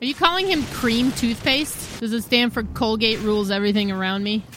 cream

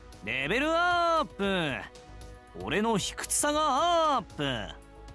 level up

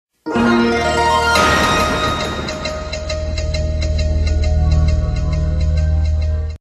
motorola logo

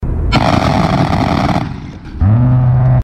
a 10 warthog fire

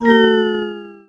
byuuuuuun

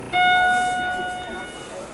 Otis Europa 2001 up chime

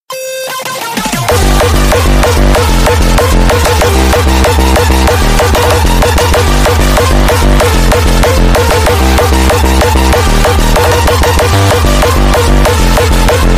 hardstyle

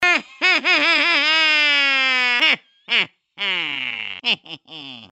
evil laugh

